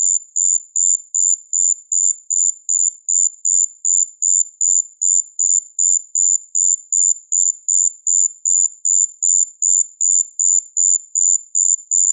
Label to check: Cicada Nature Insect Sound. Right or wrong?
Right